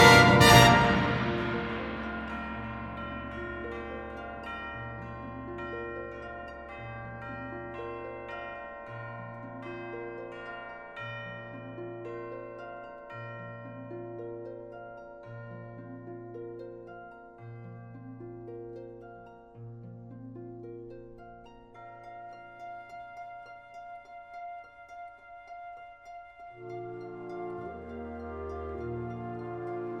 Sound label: Wind Symphony; Cantus Laetus - Mvt. 2. Calamus